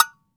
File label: AGO SQUEEZE; AKAI S-Series CD-ROM Sound Library VOL-5